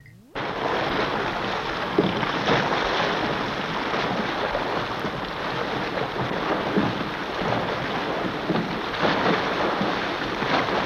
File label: Bow Wash Up Down Move